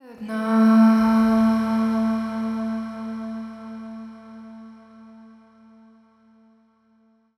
130bpm Am, Vocalpack

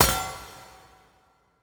UI Metal Armory Tab Wet; User Interface

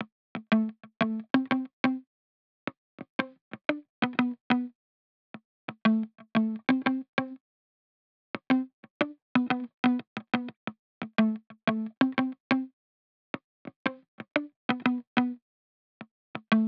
90 BPM A Min Muted, Guitar; Loop Stems; Cymatics; Brand New

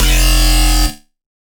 Bass F Error, Dubstep; Bass One Shots; Ghosthack; Dubstep Sound Arsenal; 50 Bass One Shots

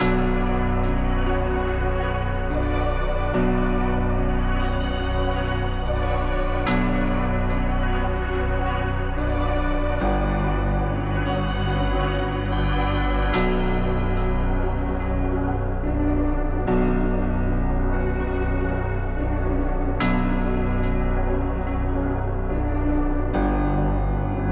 144 BPM B Min, Drill; Melodies; Break Out

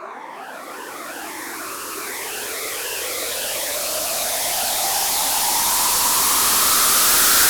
Whirl White Noise Up; House; FX

